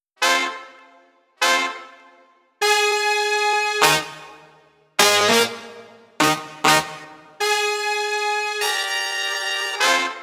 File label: Pop construction kit